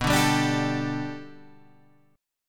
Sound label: G Suspended 2nd B, G2/B chord; G2/B strummed